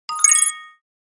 Digital futuristic confirm

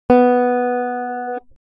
Squier telecaster B string, guitar

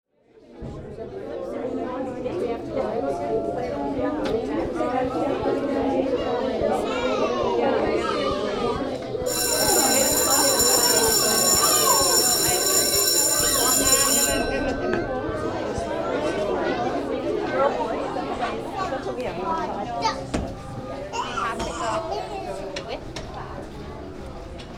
High school hallway ambience bell ring

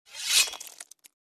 Bloody gore cutting

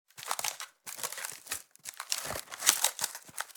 Wicker basket creaking

